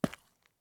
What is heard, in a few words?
run asphalt; Escape from Tarkov